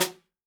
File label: snare drum, jungle snare chromatic